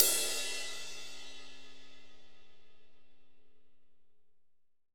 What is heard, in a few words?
AMB RI, Drum Kit, AMBIENCE; AKAI S6000 CD-ROM - Volume 3; AMBIENCE KIT1